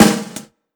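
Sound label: sparkly snare drum a key, tuned to the A note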